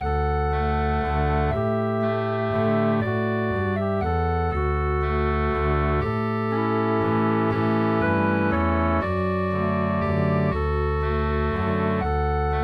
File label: Spanish Fandango Melody Harmony Bass